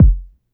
Kick; Ableton Live 12 Lite; Core Library; Drums

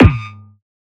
E-Perc Screech Kick, Electronic Percussion; Drums; Ableton Live 12 Lite; Core Library

